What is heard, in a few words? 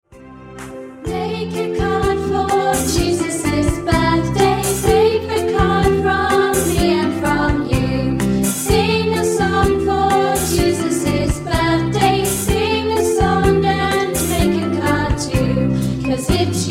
happy birthday jesus